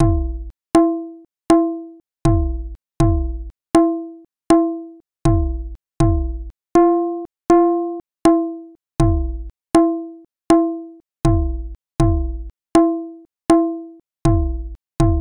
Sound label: tabla